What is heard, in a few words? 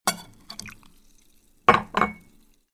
Pour the liquor into a shaker